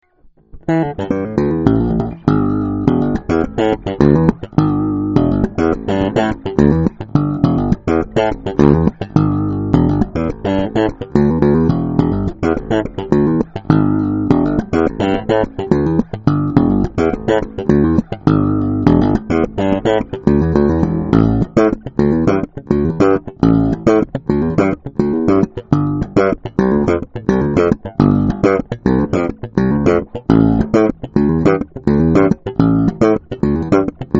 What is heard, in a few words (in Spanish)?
bajo